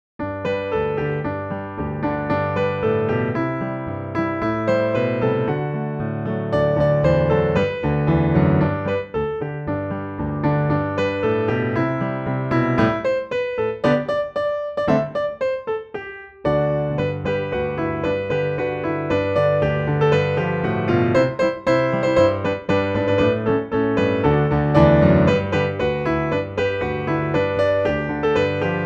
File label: Jingle Bells transcription, piano